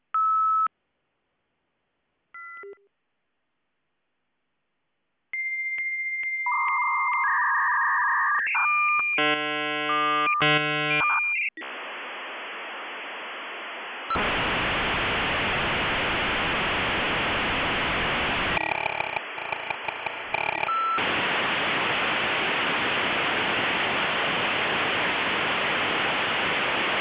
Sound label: ESS Teledrive stuttering bip